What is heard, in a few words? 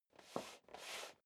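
step leather shoes metal dry scuff, footsteps; schritte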